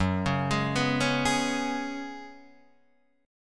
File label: Gb Suspended 2nd Suspended 4th, Guitar Open D